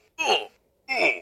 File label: damage